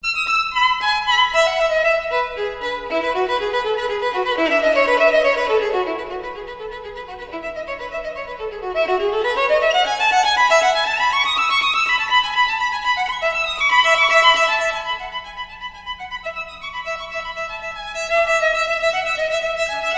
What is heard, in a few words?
Prelude in E Major for Solo Violin; J.S. Bach